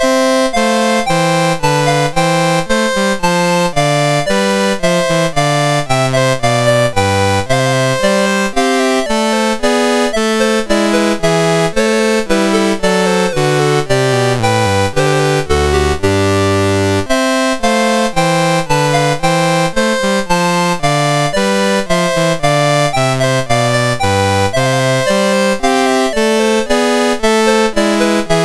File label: Orchestral Suite 1 Gavotte I, MOS6581R4